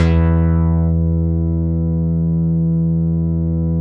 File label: digital, Bass Analog & Synth techno; USB Soundscan vol.12; AKAI